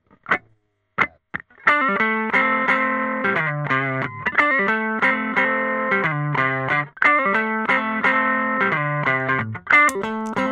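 With / Without / With Sardine Can (Compressor + Rectifier + Color Violet), guitar; Celmo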